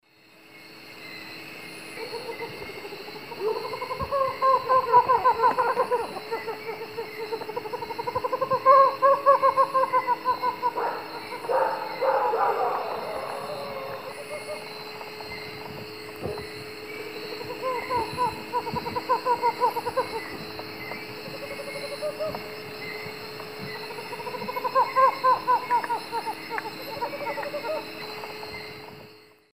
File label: Megascops choliba, songs 3 birds; S. America; Eastern Lowlands